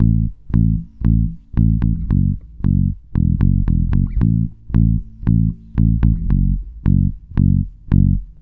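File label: RETR BASSE, 114 bpm; USB Soundscan vol.32; AKAI; Roots Disco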